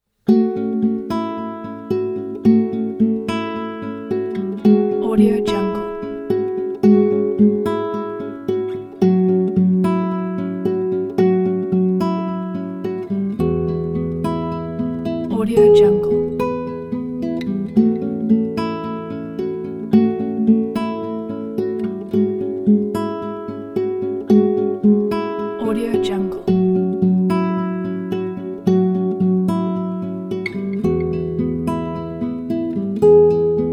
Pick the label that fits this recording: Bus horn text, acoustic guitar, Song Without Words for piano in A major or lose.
acoustic guitar